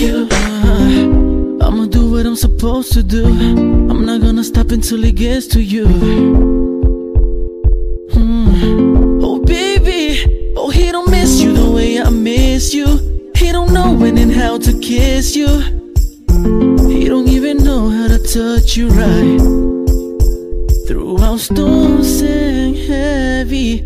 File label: Baby Cris